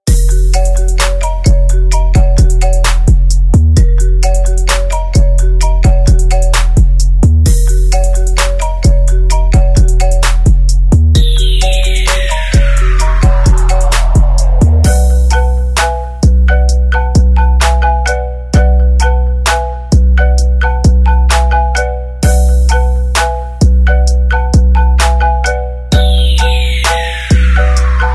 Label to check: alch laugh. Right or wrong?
Wrong